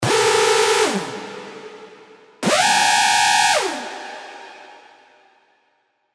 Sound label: Screech; revealed serum hardstyle vol 2